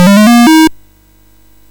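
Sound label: Mountain King, MOS 6581 SID chip music, games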